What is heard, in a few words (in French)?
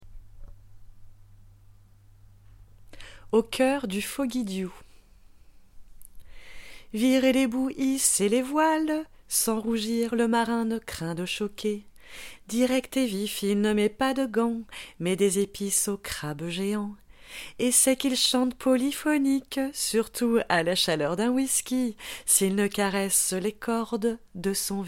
au choeur du foggy dew